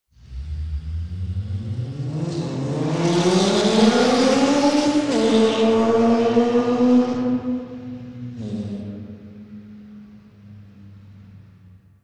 heavy race car speeding reverb

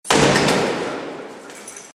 loud slam noise

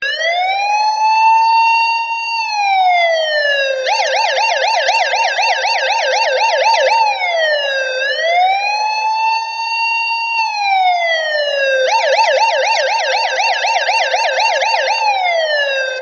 police operation siren

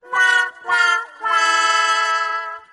womp sound effect; sims

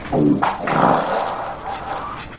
fart; fart lord

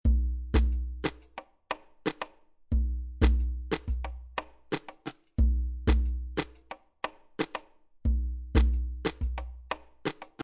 middle east ethnic tribal percussion

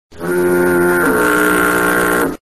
frog horn

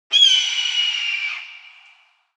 hawk call squawk

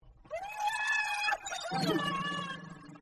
pig crying; king pig

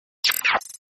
sub glitch

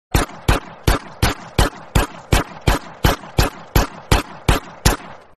pistol gun shot; gta san andreas

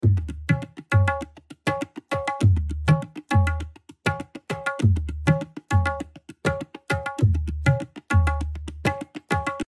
tabla sample

